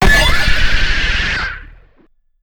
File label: screaming baby static; dogma